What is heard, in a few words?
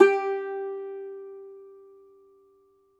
Cumbus Saz; Plucked Strings; E-MU Producer Series Vol. 7 – Old World Instruments